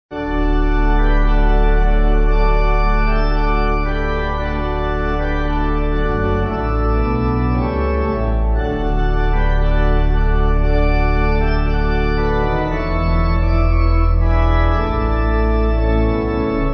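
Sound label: Pipe, hymn backing; P.P. Bliss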